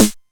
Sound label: 909 Snare, Novation Drumstation; Novation DrumstationV1